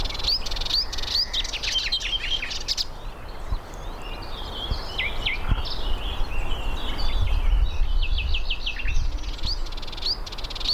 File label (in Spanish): Zarcero icterino Hippolais icterina